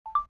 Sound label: stop; Voice-IME